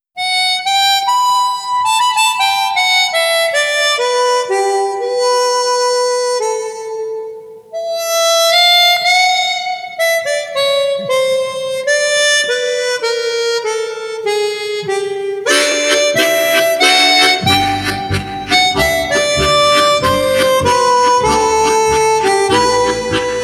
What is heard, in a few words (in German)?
shuffle, mundharmonika